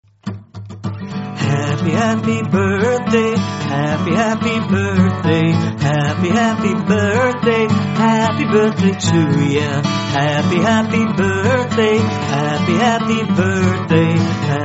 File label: Classic Happy Birthday To You Song Short Version